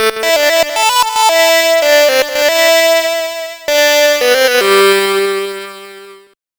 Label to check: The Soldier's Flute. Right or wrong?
Right